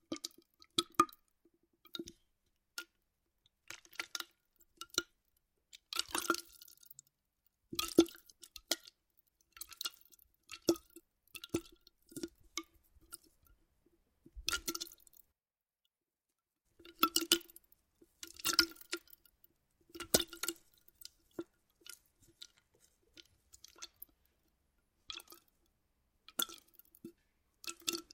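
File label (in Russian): water in a plastic bottle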